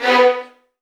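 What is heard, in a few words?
VN FX B1 left; ILIO; Kirk Hunter Virtuoso Strings VOL-1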